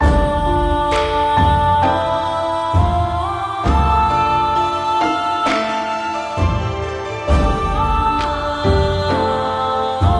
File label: Soul Ceremony 66 Bpm; legrand legacy tale of the fatebounds